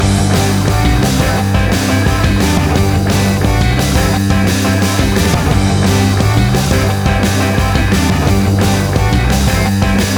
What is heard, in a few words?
Big and Bold Pop Punk Rock Music